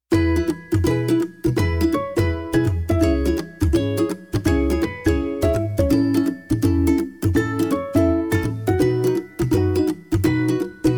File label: intro music